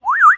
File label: parrot; Level4 Hidden World